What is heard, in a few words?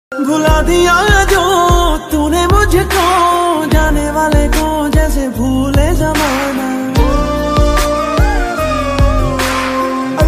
song ringtone punjabi songs sad songs